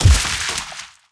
Giblet Splatter; Sci Fi Weapons Cyberpunk Arsenal

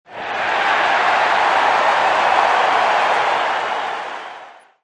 Cheers; fightingsports

